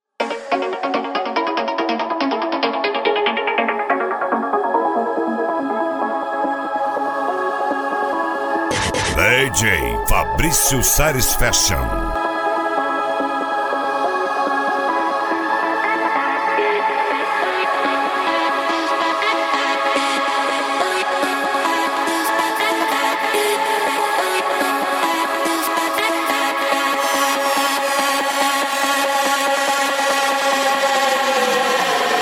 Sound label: deep house